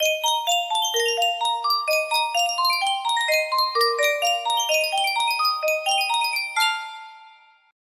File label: sankyo custom tune miniature music box